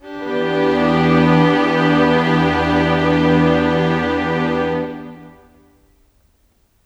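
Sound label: Pad E minor 7, Orchestral; Sonic Foundry (Sony Creative Software); Orchestral Series VOL-4 - Rock and Pop; Pads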